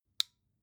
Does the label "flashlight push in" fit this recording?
Yes